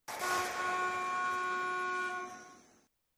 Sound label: bus